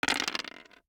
DICE on Hard Wood, Throw and Roll, Standard, Four Dice; Samples; ArticulatedSounds; DICE Sfx Party (Extended)